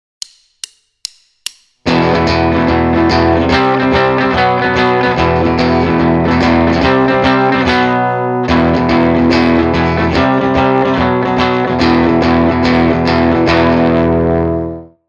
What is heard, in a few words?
open shuffle eight's, Rhythm Guitar; Basic Rhythm Guitar II - "rock it!"